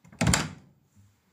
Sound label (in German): Klinke